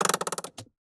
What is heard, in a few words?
lever pulled, slots; garrysmod; blues-slots